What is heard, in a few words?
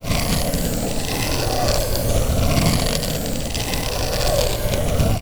recorded Circle